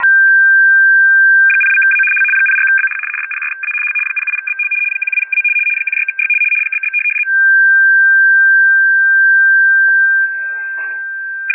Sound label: RTTY